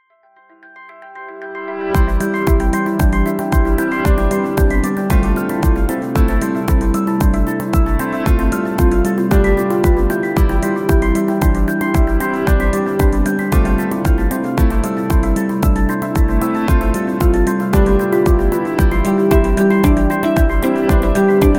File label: Corporate Spirit; corporate music vol 6